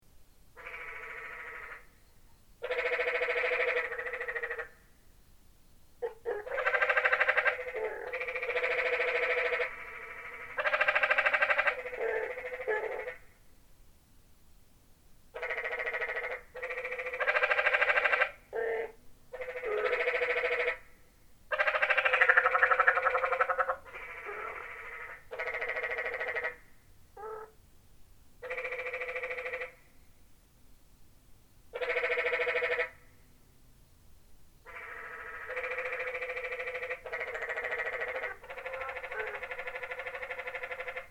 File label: Canyon Tree Frog; Quiet American